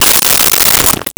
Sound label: Glass Break; Crashes